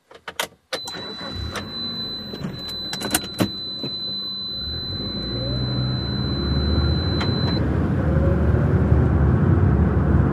Sm Car Eng Accele, compact

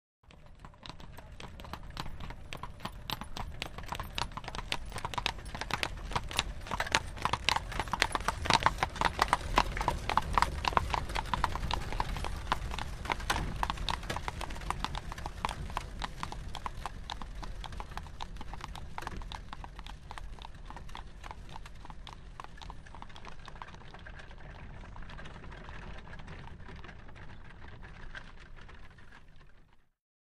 Carriage By Slow 1 Tar, carriages

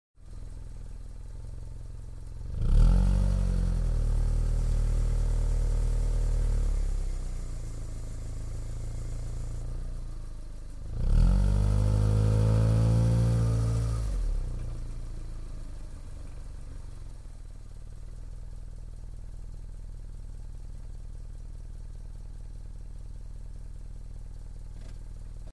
Sports Car Idle Rev

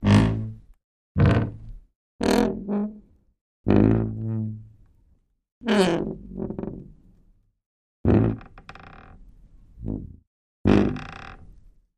Door Wood Creak Short; hinges creaks

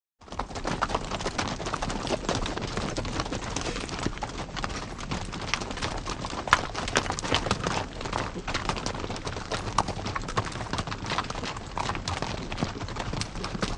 Horses Constant Past

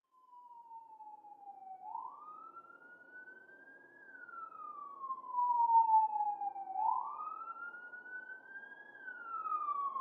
Siren Slow Police By

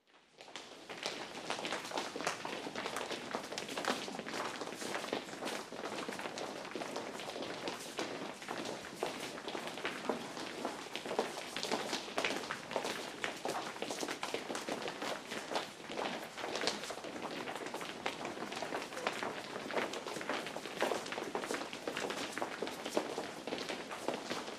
Footsteps School Hal, group

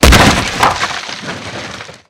Body Falls On Debris

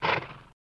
Horse Blow Generic

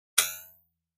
Button Toy Drum Hit; buttons

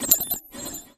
Computer Button Press, stinger

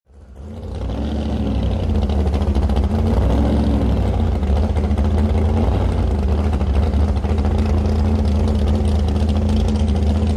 Drag Boat Loading

